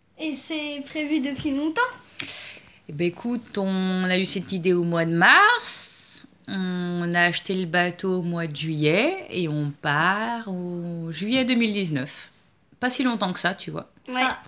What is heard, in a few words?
Vocal